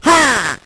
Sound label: "ha" cartoon